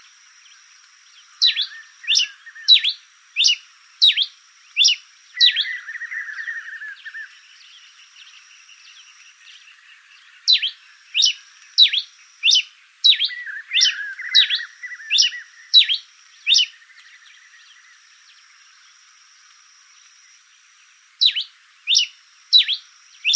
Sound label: coryphospingus pileatus